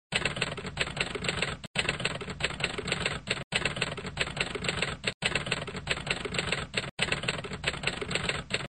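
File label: sound of typing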